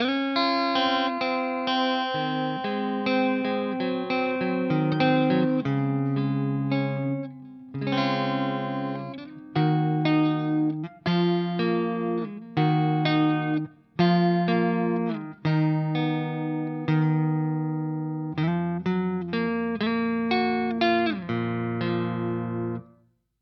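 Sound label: Neck pickup clean, guitar; Schecter Omen Elite 7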